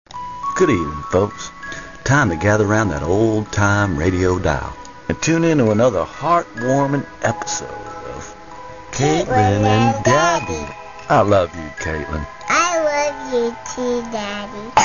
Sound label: cough